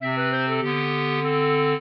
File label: trio, clarinet; Mozart